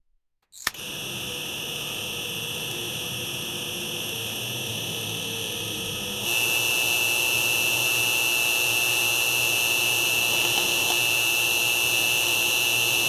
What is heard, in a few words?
volkswagen boxer engine; automotive